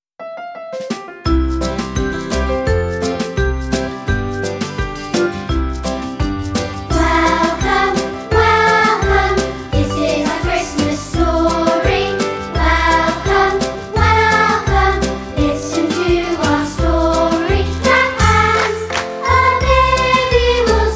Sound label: Welcome Vocal